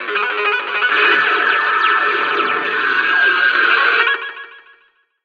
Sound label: Arcade Cab